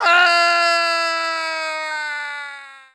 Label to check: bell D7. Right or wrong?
Wrong